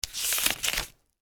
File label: page; easyrpg; Ib